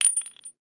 case, explodium gun

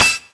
bounce; hellblazer

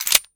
slideback, explodium gun

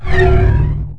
gravity on

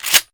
Q Pump

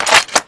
Ammo Pick

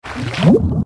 DIVE

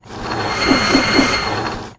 B Saw Loop; Marisa Kirisame

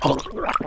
M Drown

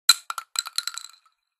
spread casing; spreadgun

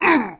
injur

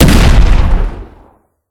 mag hit, explodium gun

